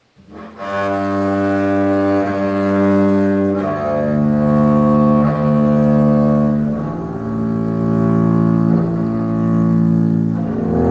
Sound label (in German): kontrabass